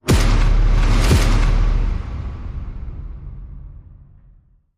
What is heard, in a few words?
Hit Big Double Slap